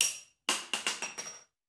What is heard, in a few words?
Bullet Shell; Sound Ideas; Guns